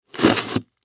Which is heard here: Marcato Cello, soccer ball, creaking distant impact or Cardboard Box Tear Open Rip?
Cardboard Box Tear Open Rip